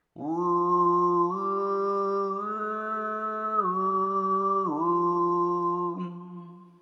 Sixth overtone in parallel among F G A fundamentals; sesto armonico in parallelo su fondamentali F